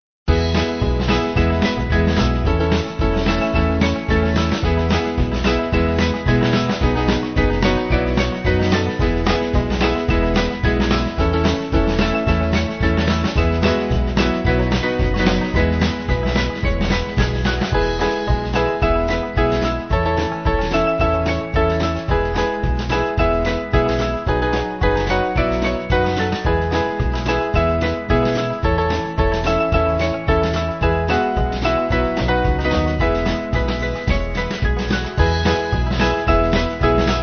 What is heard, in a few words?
Band